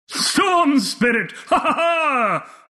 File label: hahaha; announcer dlc pflax